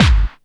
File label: KIT HOU; Best Service; Dance Mega Drums